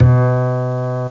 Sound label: Acoustic C2, Bass Hits; Aminet